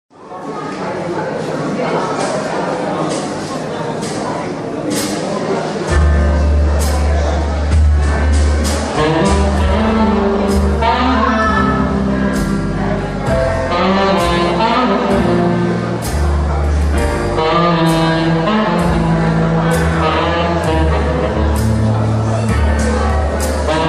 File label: sax